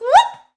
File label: mom fall; Ringling Bros. and Barnum & Bailey; Wii